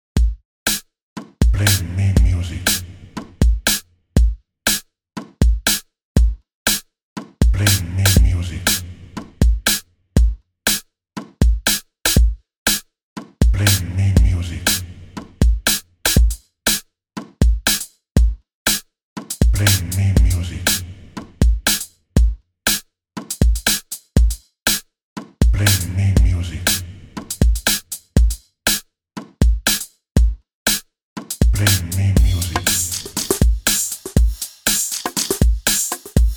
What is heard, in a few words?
DRUM; drum kit one